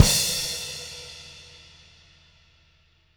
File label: CW KIK -L, Living Drums, SD CYMBALS; Sampleheads; Peter Erskine